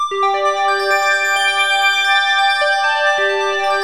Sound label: SYNTH FX